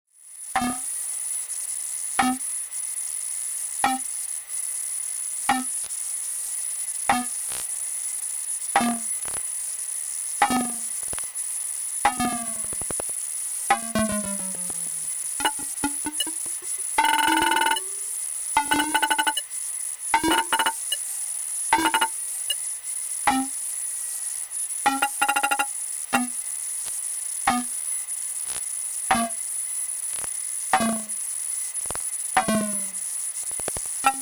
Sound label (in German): Insektensprache